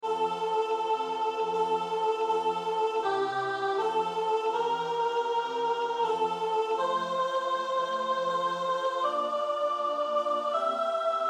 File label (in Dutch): sopraan; Motetten; Sancti Dei